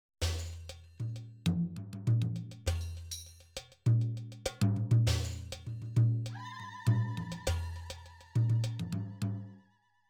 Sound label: Simmons SD1200 Perc Middle East